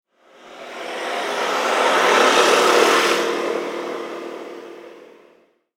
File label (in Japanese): バイク通行音